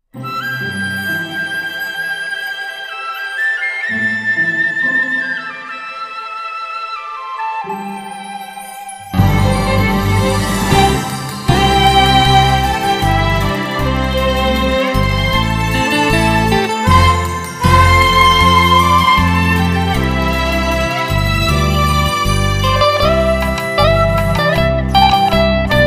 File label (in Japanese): Enka